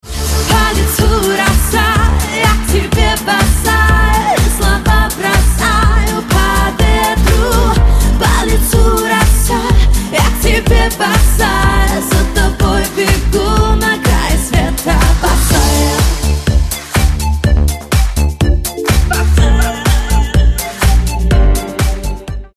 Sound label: russian ringtone